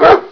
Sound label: DOG